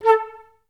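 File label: flute staccato A3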